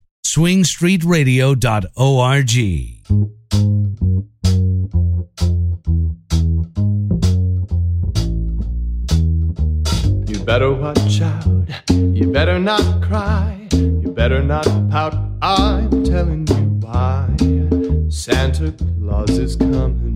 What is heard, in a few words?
Swing, Jingle Bells